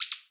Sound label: duck